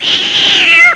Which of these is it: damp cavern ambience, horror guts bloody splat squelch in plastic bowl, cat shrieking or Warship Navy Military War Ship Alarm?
cat shrieking